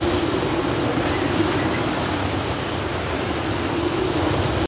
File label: Icy Wind; nascar racers windows gamerip 2000